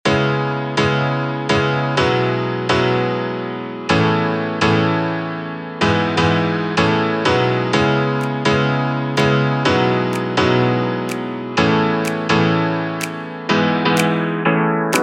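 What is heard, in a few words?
other piano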